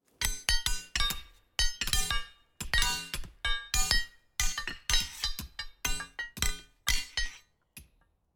tyazhelie udari metalla o metall

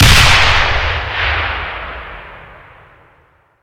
udachnij vistrel na ohote iz ruzhya

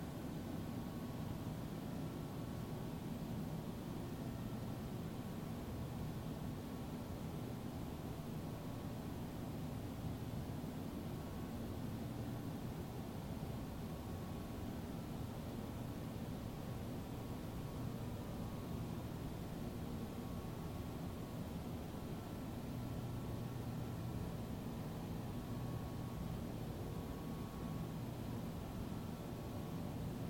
otdalyonnij bezumolchnij gustoj zvuk raboti proizvodstva

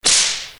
dlinnij knut ataka svistok

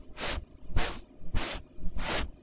sovkovaya lopata priglushennii shurshashii

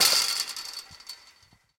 drebezzhanie metallicheskogo predmeta

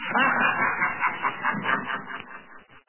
zhutkii smeh odinochnii dolgii zhutkii

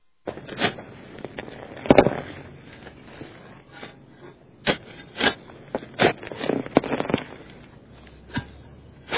sovkovaya lopata blizkii zvonkii shumnii

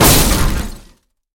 korotkij moshnij udar po grude zheleza

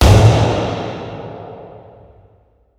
grandioznij gravitacionnij tresk zvuk

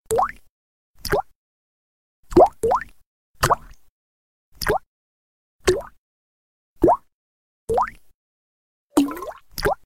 zvuk kapel vodi kapayushaya voda

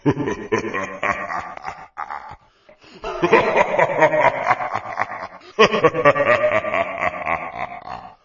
zhutkii smeh muzhskoi nizkii v prostranstve

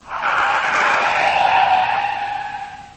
skolzhenie shin po asfaltu

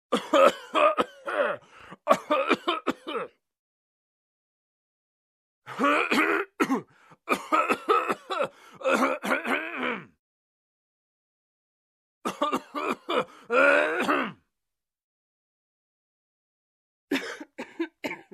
kashel muzhchini i zhenshini

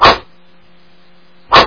dvojnoj udar knutom

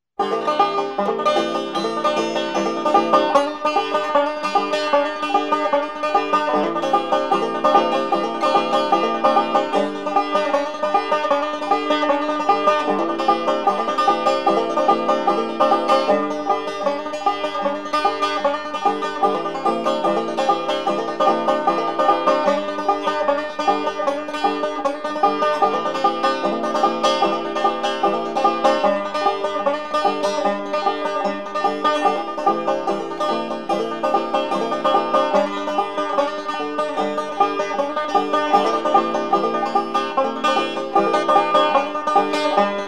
igrayut na bandzho

